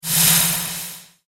Magical Spell Caster, fantasy sound effect; Magic Sound Effects; Andrew Fleming

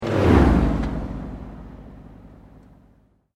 Sweep Mechanical Slow

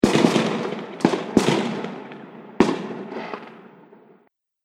Fireworks Many Bangs And Whoosh; Celebration, Party, Holidays

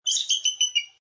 American Bald Eagle Squawk Staccato; Bird of prey: American bald eagle sound, staccato squawking; Lynne Music

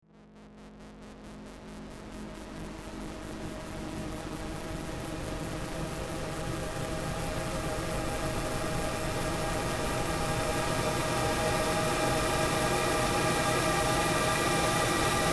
Docking spacecraft with station; Spacecrafts

